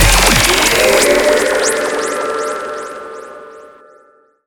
Game Success

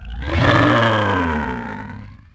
Roar, Dino

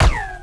rico repeater Cannon

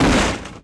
bouncy barricade hit; bouncy objects; Tony Hawk's Project 8; XBOX

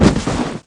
Baddie Collapse, Falls

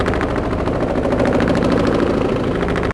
Helicopter distant; chopper; Max Payne

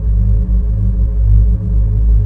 shaft, ambience; artus; Star Wars Jedi Knight II Jedi Outcast; XBOX

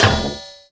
BIG Ball Bearing, Impact; Cars; XBOX

